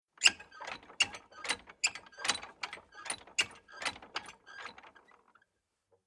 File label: Squeaky Rattling Metal Movements Creaking Rickety; Machinery